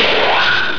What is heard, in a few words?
phazor